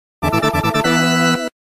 Coin Credit; Galaga

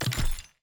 UI Research Completed; A Year of Rain

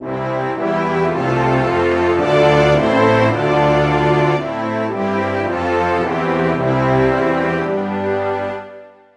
Anthem Japan; Buzz! The Mega Quiz; PS2

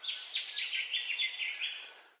bird call; children of morta

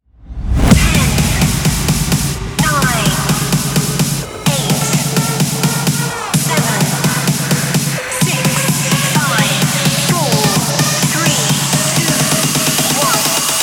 melody (Instrumental)

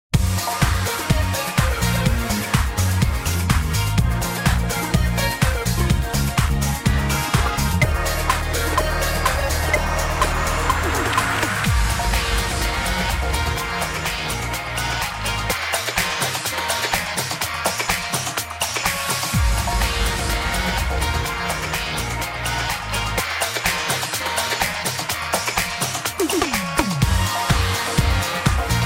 Battle Disco; Digicalized; Android

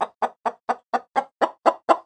Chicken

